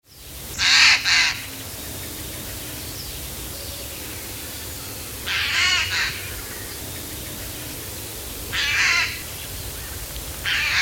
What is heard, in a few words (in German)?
Eichelhäher